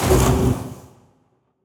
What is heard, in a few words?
harpoon Move Start; CARRIER